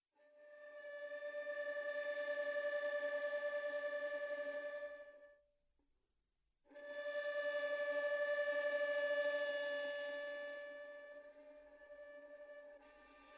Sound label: violin beats